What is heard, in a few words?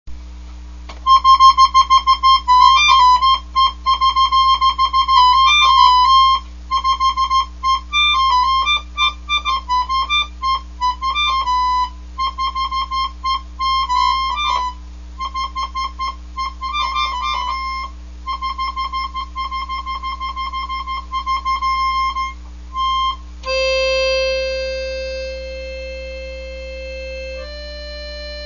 drone